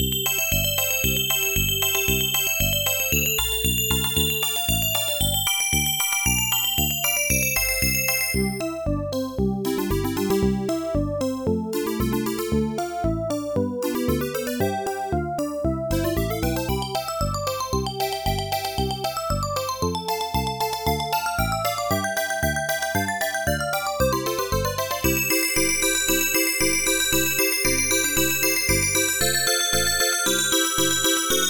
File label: BELLS; SoundWare Collection (CMS Software Distributing) (1993)